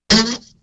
WACKY

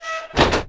cab door; PC Gamer IT CD 45; SMAN DEMO

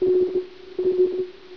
sub; in your face cd

